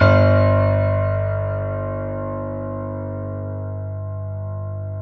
PIANO G1, STEINWAY; Greytsounds; Philip Wolfe David Crozier's Saxophones; GR8 STEINWAY

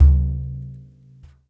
Frame Med, World Percussion, One Shots; Sony CS

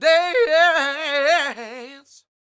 DANCE; Spectrasonics Vocal Planet

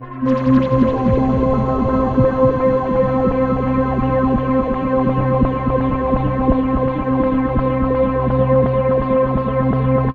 Pad; Dream Zone

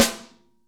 SN, Real Mega Drums

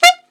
ALTSAX D3; Best Service; Alpine Volksmusik

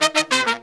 Pop & Funk Brass

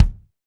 BD, BD REAL

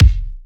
kick one shot hi

